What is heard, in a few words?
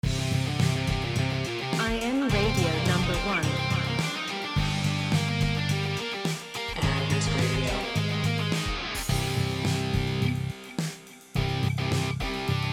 Rock-Metal